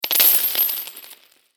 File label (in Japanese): 小銭をばらまいた音